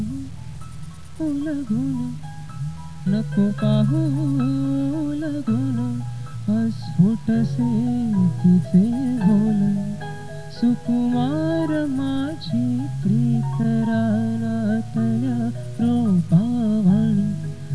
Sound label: music